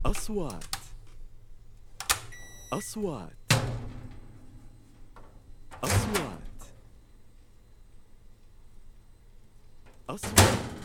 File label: Microwave Eating heater kitchen